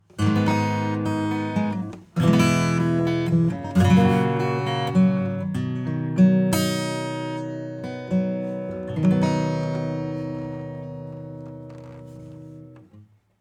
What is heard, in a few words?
Gate E Guitar Input; SSL X